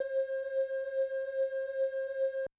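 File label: Choir, Yamaha DTXpress-II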